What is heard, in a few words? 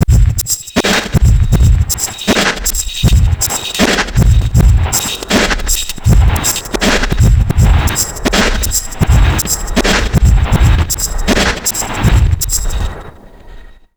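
neuro, drum loops crazy processed, 80 bpm; USB Soundscan vol.07; AKAI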